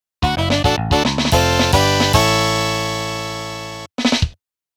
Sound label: Mini-Game Victory; Ganbare Goemon; The Super Famicom Collection